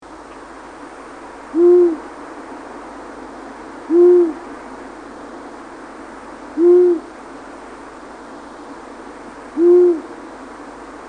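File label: Long-eared owl; Madarak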